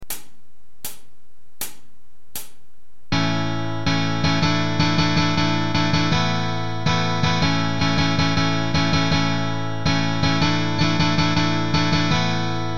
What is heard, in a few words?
suspended chords exercise, guitar